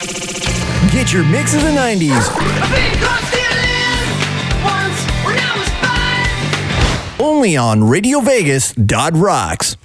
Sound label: Sweeper Mix 90's; RVR